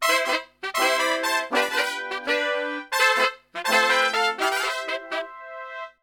MEX C, Brass, MEXICO LICK; Best Service; Brass Super Section

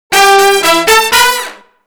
BRASS LICKS, Disco Fever; Best Service ProSamples vol.48 - Disco Fever; AKAI

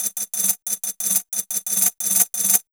DRMS, Pure Electro; USB Soundscan vol.03; AKAI

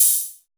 SYN BASA F, TECHNO; Drums In Your FACE!

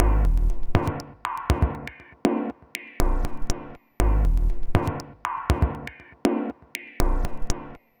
Mod Loop, Modular Percussion

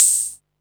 hat, Techno 138 BPM

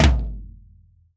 drum, mixed drums, World Instruments

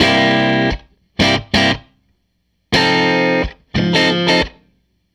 Tele Guitar Loop

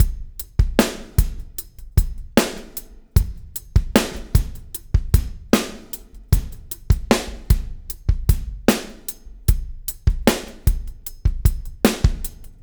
REV, REVEREND; Spectrasonics; Backbeat

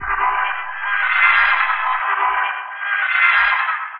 Wet Shawm, Woodwind

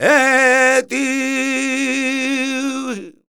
voc c, Flamenco Vocals Male Cantaor

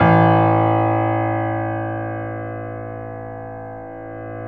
PIA; Best Service Gigapack 1

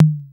KR-33 Tom-Mid, Korg

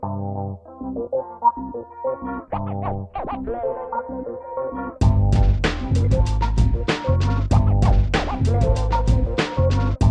96BPM, Hip Hop; InVision Interactive; 40 Oz Phat Slammin

